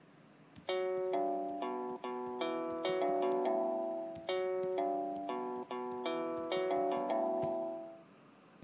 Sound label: keyboard; les paul google doodle keyboard activated